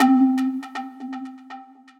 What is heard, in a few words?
Pipe Delay, Synth Lead